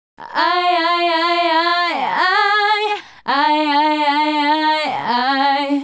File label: Female Group, Hip-Hop and RnB Vocals